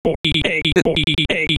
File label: cat